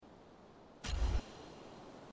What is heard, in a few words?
making choice sound; Life is Strange 2; gamesoundopedia